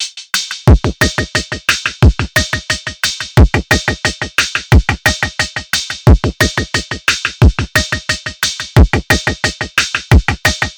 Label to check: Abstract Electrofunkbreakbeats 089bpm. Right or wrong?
Right